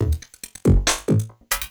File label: LOOP, 140 BPM, Drum & Bass; Ueberschall; Drum & Bass Resonance